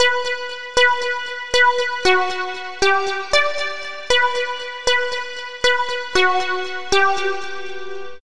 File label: Beats